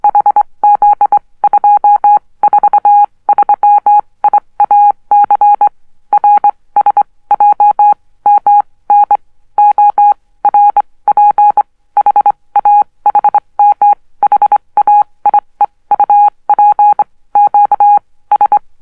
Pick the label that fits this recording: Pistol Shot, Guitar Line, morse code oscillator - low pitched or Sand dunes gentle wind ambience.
morse code oscillator - low pitched